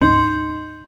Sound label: bell; Hamsterball